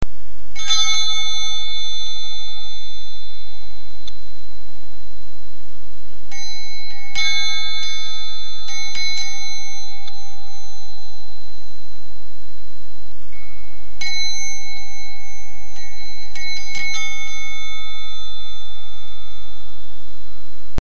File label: tempel bell trio